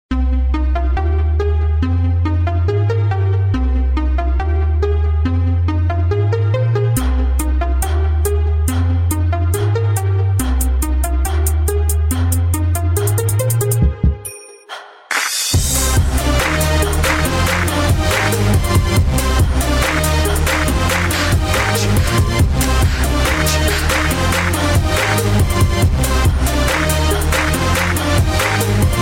Hip Hop Beat

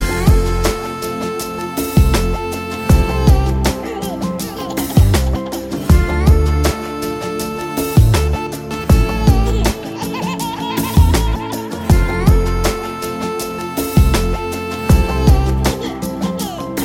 Baby Song

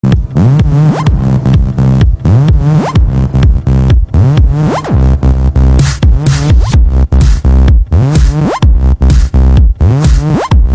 Electro Tone

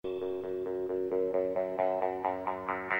Pop

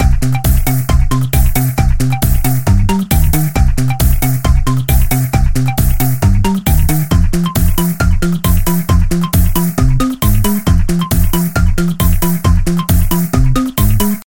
Drumbass

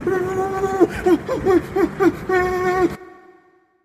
notification

